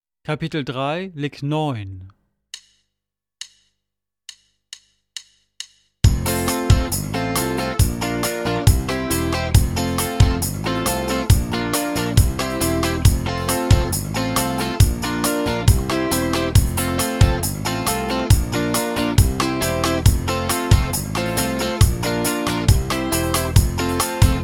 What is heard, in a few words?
Latin Style Guitar